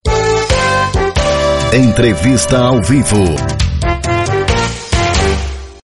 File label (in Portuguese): vinheta